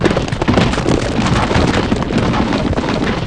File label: RK ROLL; REDNECK; RAMPAGER